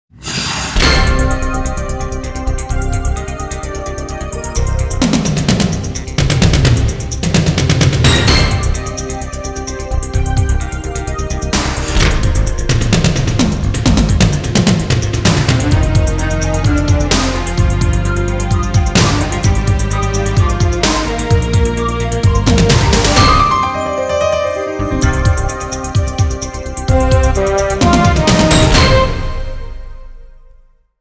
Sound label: Police Drama Promo